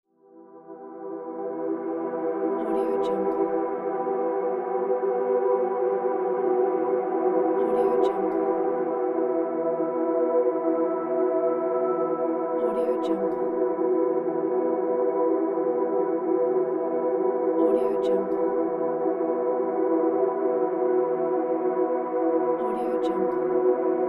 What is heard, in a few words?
pad